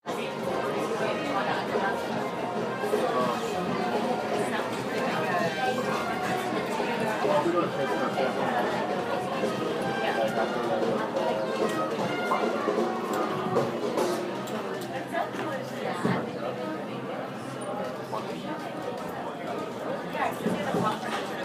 distant jazz augusta avenue kensington market toronto canada